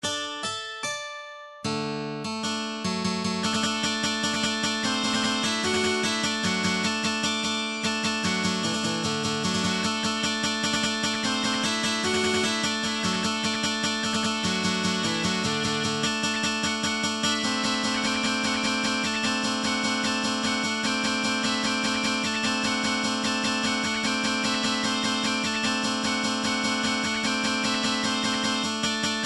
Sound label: dulcimer stomp